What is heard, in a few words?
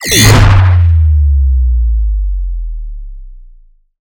Emp